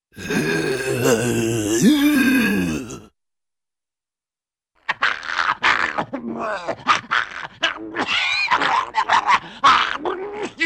Monster-like Groans Throaty Gargley Choking Spooky Raspy, Cartoon; Hollywood Edge; Cartoon Trax